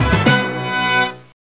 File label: Fanfare Medieval; Cheesy Lo-Fi Sound Effects